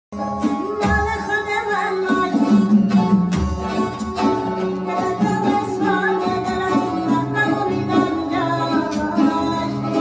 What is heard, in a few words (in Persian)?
Sedaye Bache